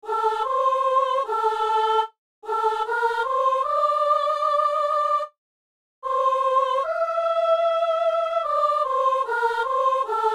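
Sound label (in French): assemblée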